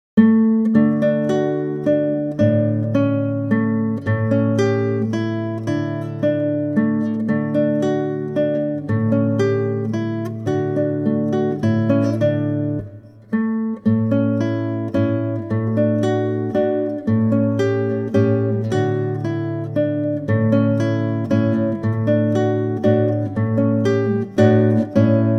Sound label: moderato, guitar